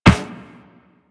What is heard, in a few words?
Run Metal Perforated, Footsteps